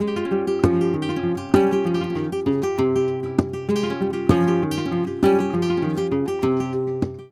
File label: Flamenco, Guitar, Bulerias Y Solea, 197, c